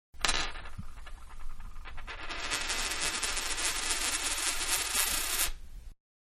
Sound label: Dime Spun On Counter, Coin; Money; Manhattan Production Music